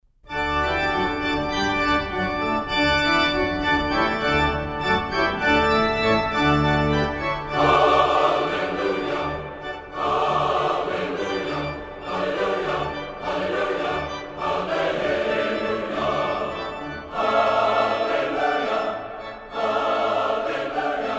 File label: Hallelujah Chorus